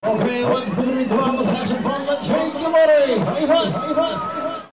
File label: jingle; Kermis Kassa Diversen